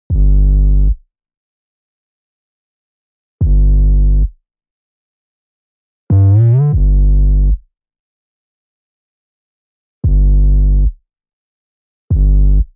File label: 808 Bass without saturation; 不带饱和效果的808低音